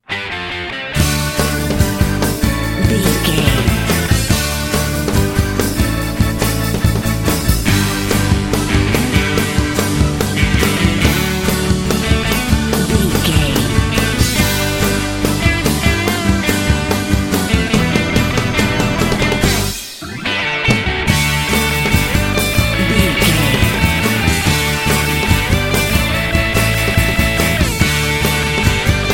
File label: super fun pop no vocal